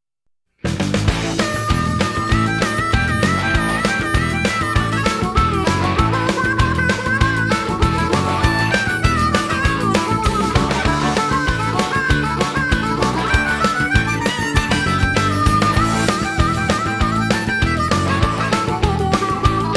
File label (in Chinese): 激情的背景音乐